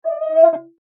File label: Foley Glass Squeak Clean Window Single; Squeaks; Objects